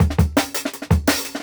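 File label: Jungle Breaks, 168 bpm; Original Jungle Breaks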